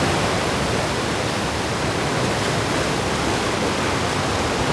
Ocean Loop; Civ4 beyond sword

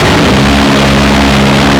GAU8 Cannon; Weapons; DCS

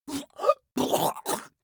scav death, voice; Escape from Tarkov; scav1

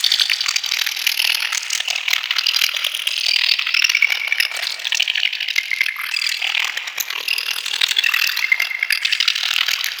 lock radar loop

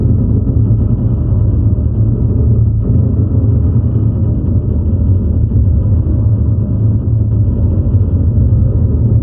Wings Overload In, Damage; DCS

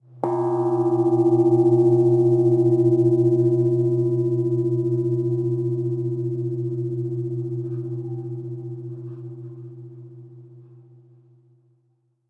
gong; World of Tanks Blitz; map asia

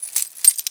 dog collar long

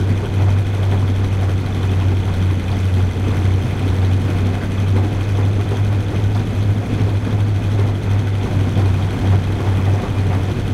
m24 engine int rpm 33, engines tanks; War Thunder

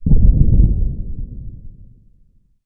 Amb Destruction; Red Orchestra Ostfront 41-45

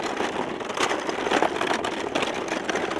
Chariot Loop; Civ4 warlords

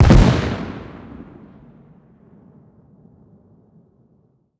expl close, explosion; World of Tanks Blitz

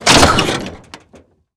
snowmobile suspension heavy

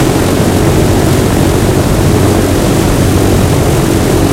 b17 side, engines; War Thunder

